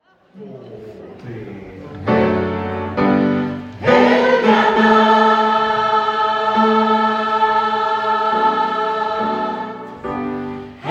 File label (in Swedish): Sopr & bas, Storkor; Helga natt